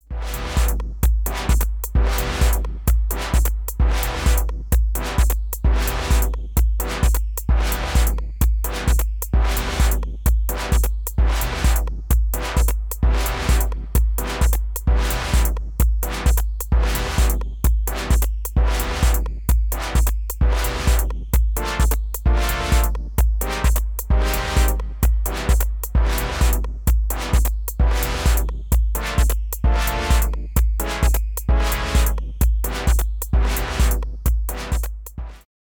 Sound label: Juno6 Noise Pad 2; Rossum EM Control Forge